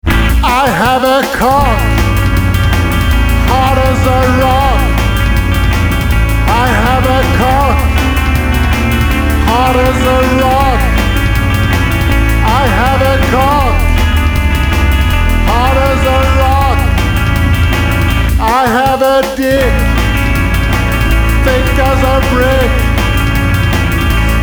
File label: Cock Rock